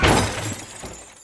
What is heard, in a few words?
crash